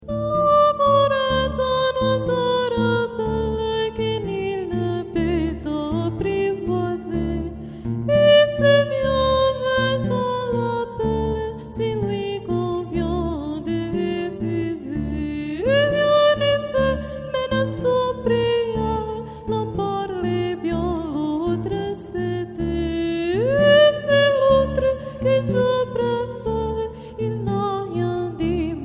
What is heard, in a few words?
habanera